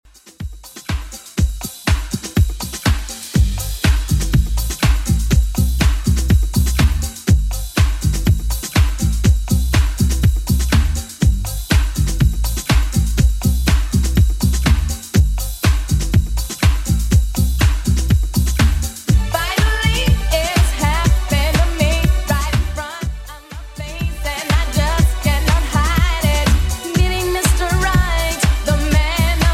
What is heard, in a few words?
90s Redrum 122 bpm